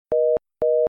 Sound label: Tone Congestion US